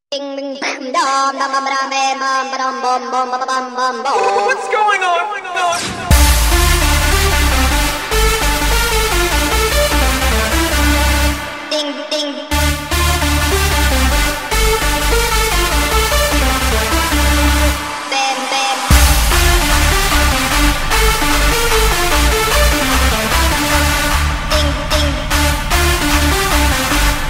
Remix Hardstyle

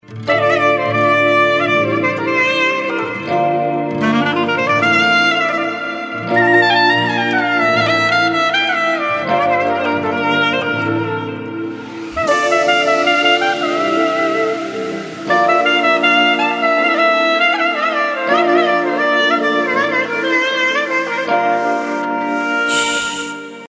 music zang mobil torki; زنگ ترکی معروف اینستا

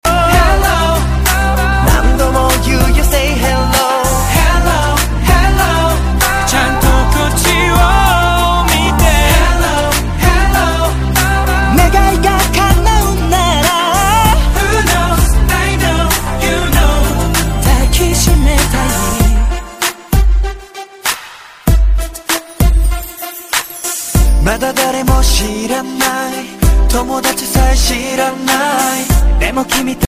Japanese Ringtone

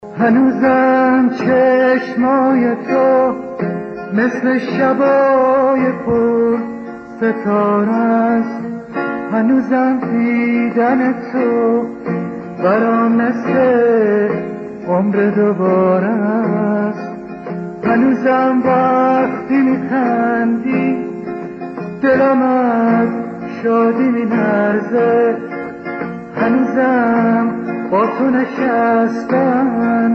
Light Ringtone

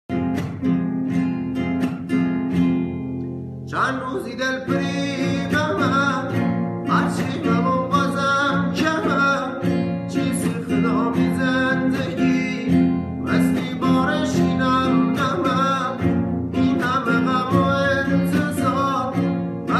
Guitar

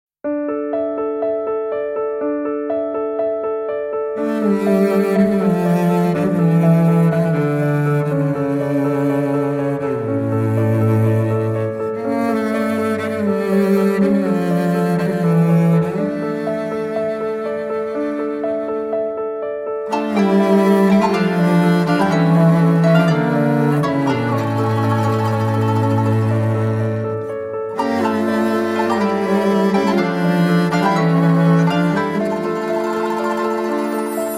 music zang mobil torki; زنگ ترکی معروف اینستا

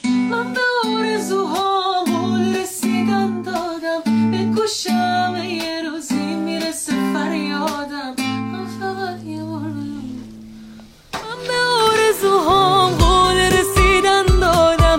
Guitar

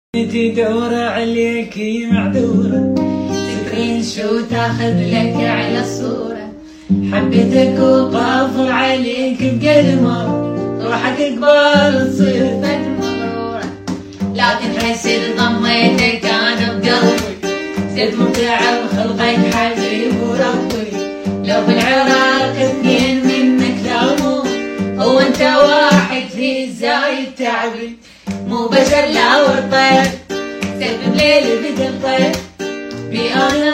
Guitar

